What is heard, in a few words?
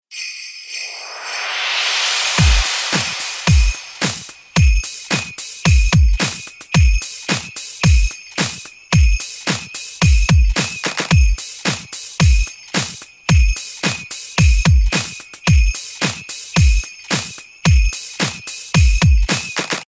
Kick Beats; pack noel